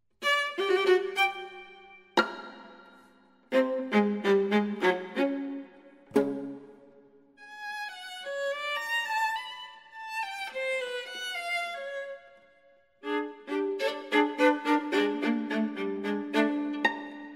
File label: Bagatellen Scherzo (Viola)